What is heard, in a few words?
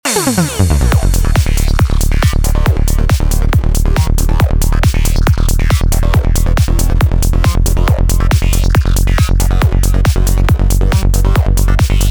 pitch wheel fun psy trance